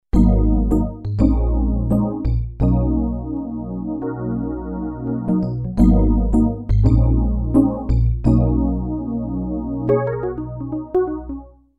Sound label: rhodes, Emu planet phatt